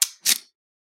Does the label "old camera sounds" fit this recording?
Yes